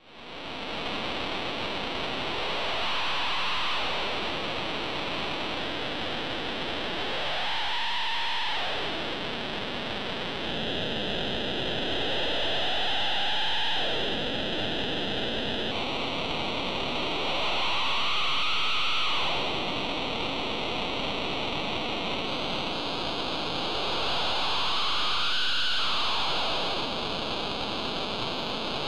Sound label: vosim noise squeals